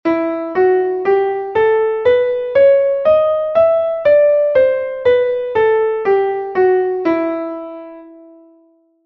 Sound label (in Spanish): mi menor melodica